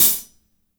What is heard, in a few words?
HAT, HI HATS; Spectrasonics; Burning Grooves